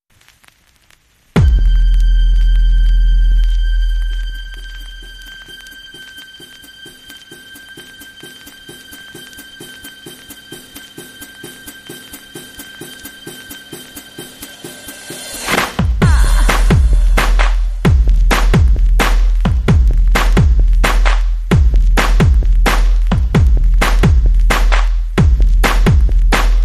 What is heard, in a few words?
Underscore